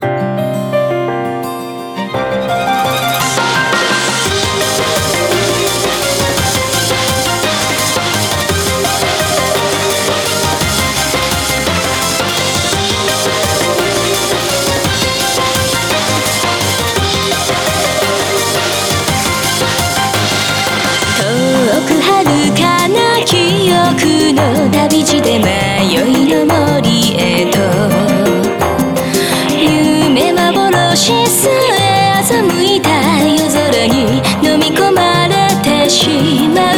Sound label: Bgm Reach